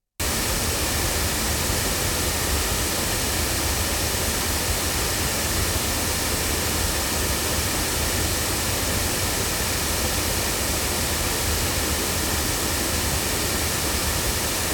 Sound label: Rode NT3 battery loudspeakers